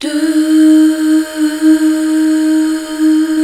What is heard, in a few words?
HAL DUH F, HAL Back.Duh Fem; BACK. DUUHH